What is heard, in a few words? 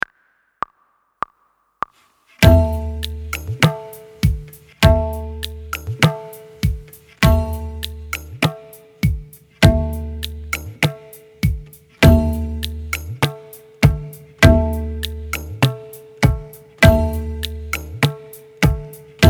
Di Practice with Slide and Da With Tabla